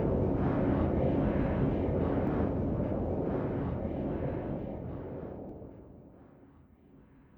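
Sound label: Booster Interior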